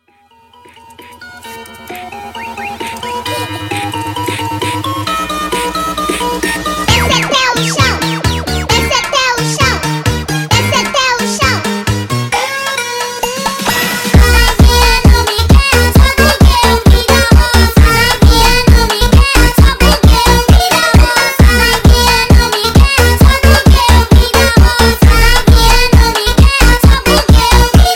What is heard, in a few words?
Funk sped Up